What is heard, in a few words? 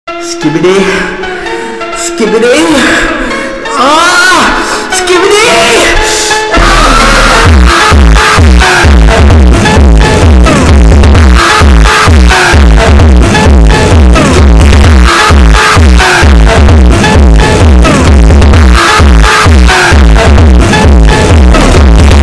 funk